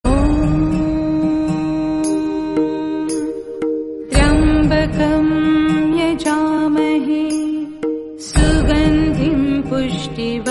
maha mrityunjay mantra

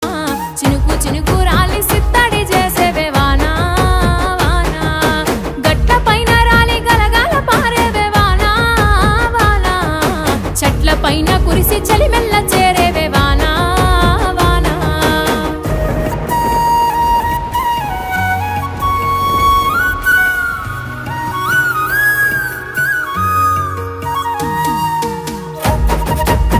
Vaana Folk Song

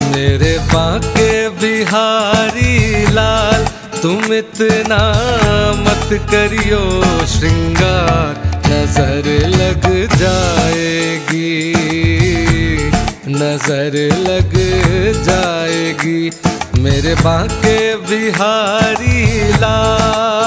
Male Version; ringtone

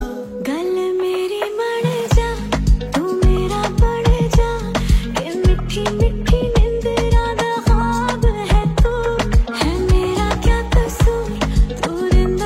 Female Version

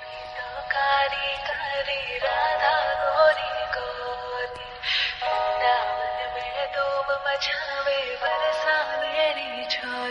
Lofi

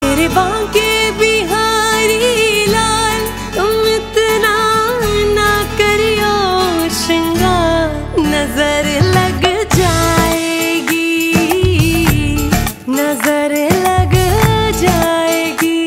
Female

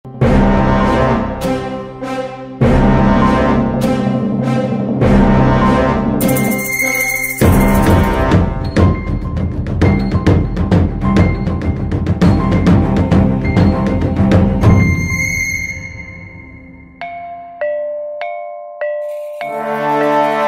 New Bgm; Raayan

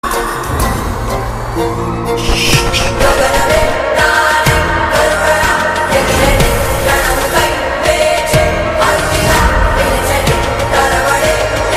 Sabdham Bgm, Telugu

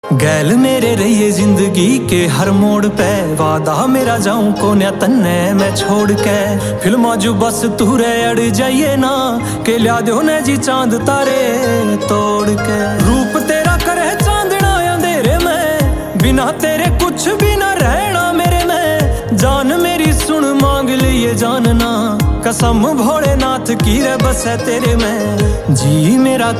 Haryanvi Song

